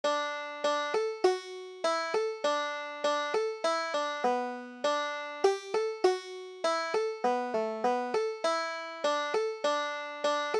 ducks on the millpond, banjo

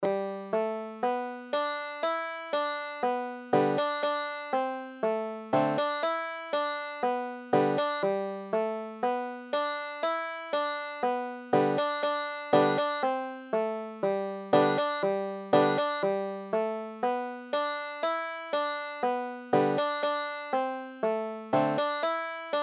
buffalo gals, banjo

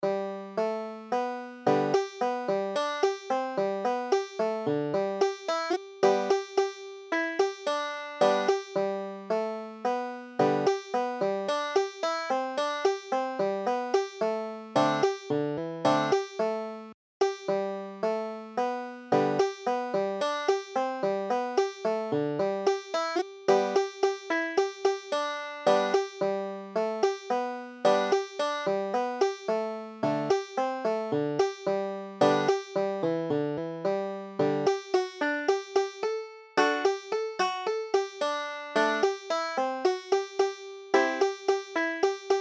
banjo

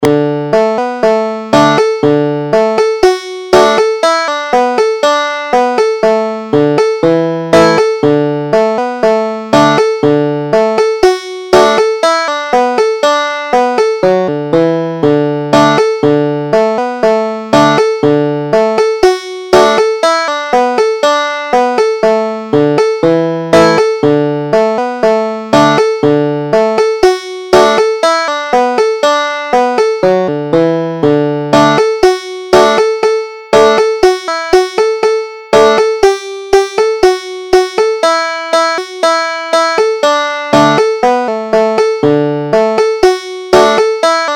grand picnic, banjo

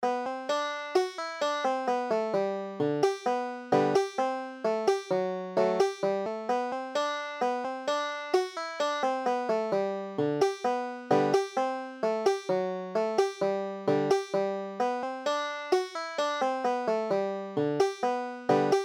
pennsylvania hornpipe, banjo

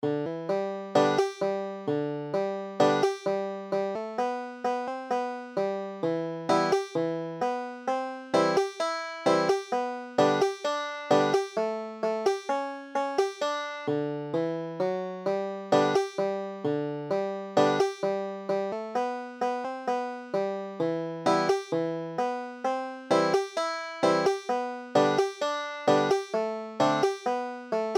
banjo

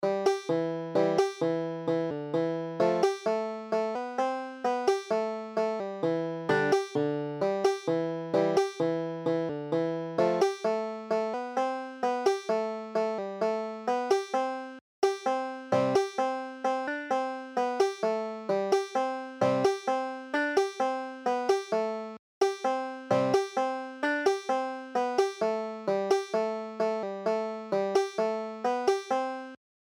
finnegans wake, banjo

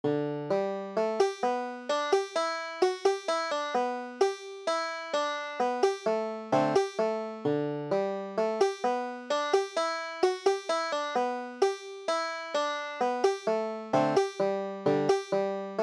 big chief, banjo

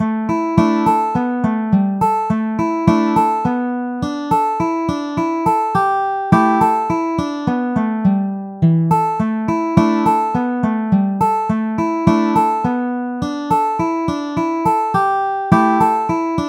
betsy likens, banjo

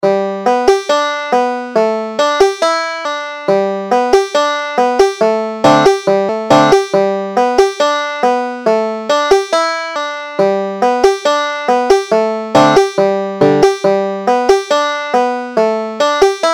pennsylvania reel, banjo

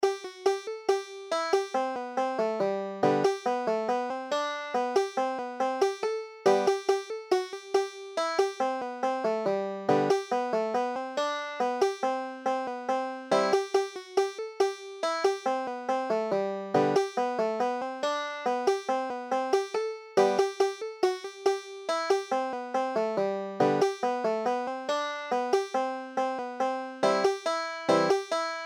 schottische, banjo; mahoning-schottische

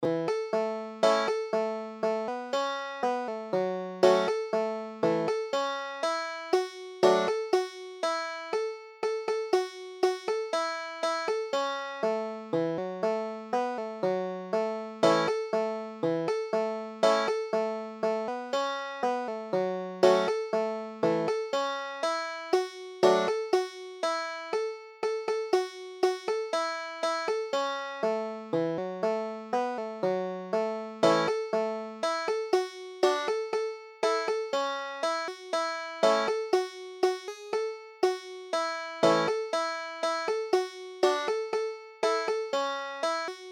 banjo

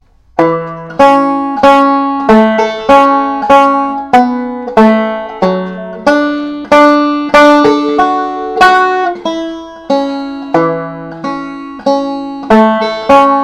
bill cheatham, banjo

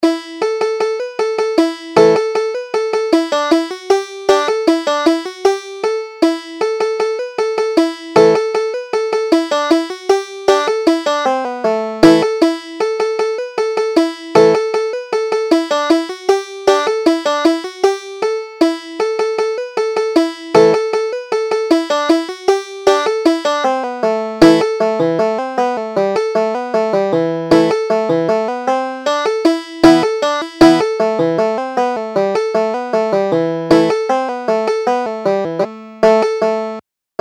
hobarts transformation, banjo

